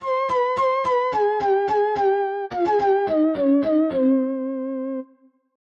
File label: Flute Jingle; Kharon's Crypt